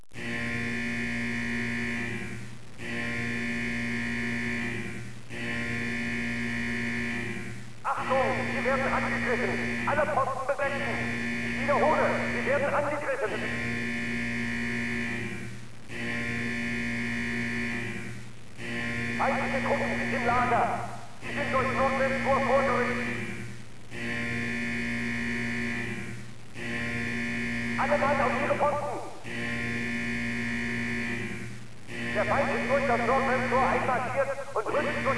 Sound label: cold alarm muffled